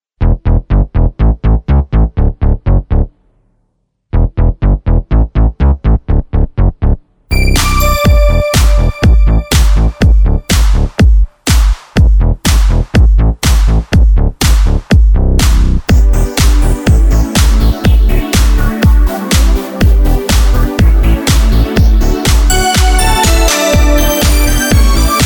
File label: Korg Pa3X